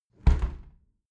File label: window close tilt heavy sequence